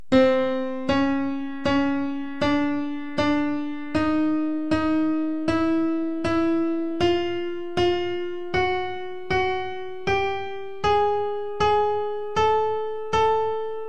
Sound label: sruti